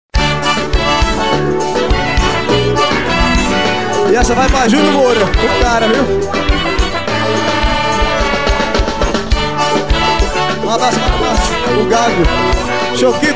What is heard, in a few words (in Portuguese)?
forro